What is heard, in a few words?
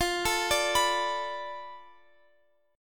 F 6th Flat 5th F down Guitar Open F, chord; F6b5 arpeggiated